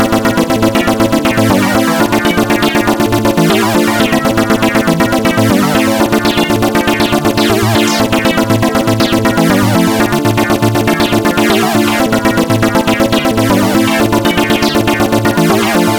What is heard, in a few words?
FM Bass Line; synthesizer-haskore